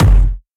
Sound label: bass kick effect